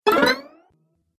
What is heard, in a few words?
Cartoon Game Fast Vibrating Jump; Bounce Sound Effects